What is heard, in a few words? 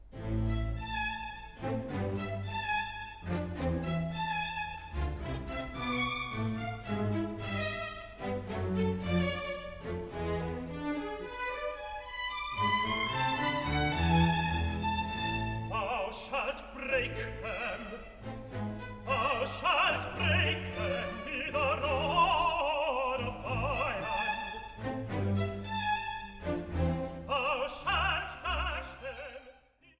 tenor; part 2, no 43, thou shalt break them